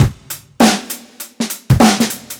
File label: beat; luppp Test Material